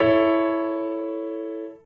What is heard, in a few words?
C#6+A5+E5